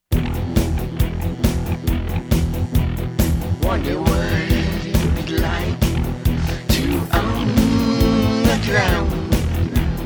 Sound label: Alternative Rock